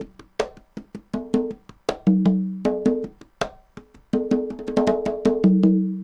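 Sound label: congas 80 bpm, latin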